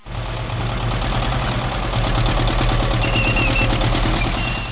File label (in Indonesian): suara mobil